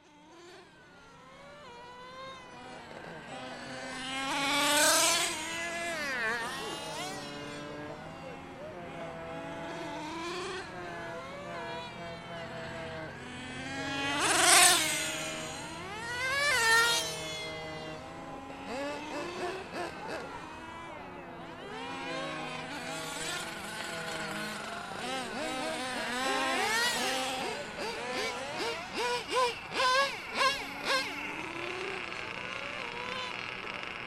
pit stop fuhlinger see rc races and roaring cars from a1 motorway